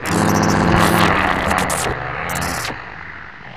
zombie idle; custom monsters; glitchzombie

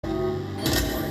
Alarme; Resurgence

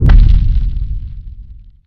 giant hit

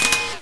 blip

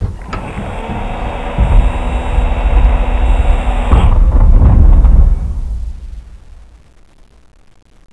alien door

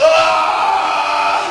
moan

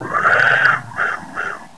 roar

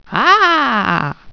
alert, dragon

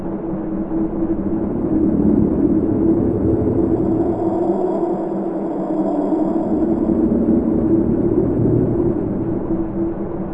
amb noise

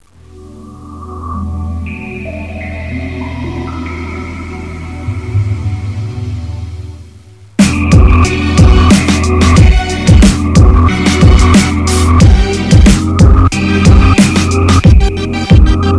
drip car

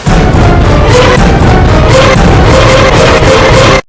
dramatic

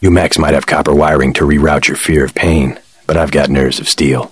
taunt; jc denton

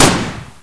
bang, weapons; vengeance

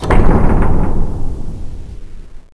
heavy stop

